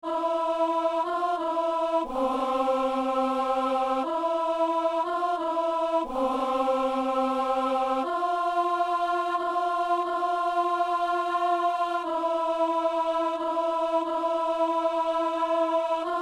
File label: alto; Messe du 17 janvier à Saint-Denis